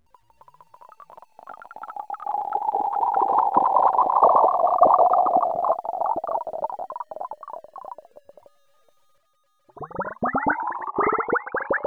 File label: poured water